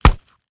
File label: blunt light; tfa starwars melee weapons pack; nmrihimpact